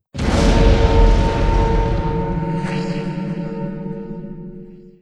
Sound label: damage collision; jeredek; tardis